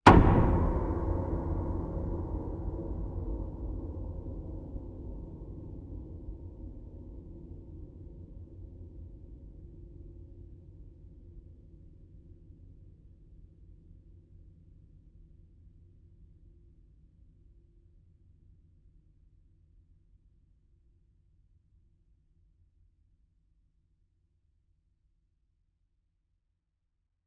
impact piano x3 spaced apart good cinematic suspense horror; felix blume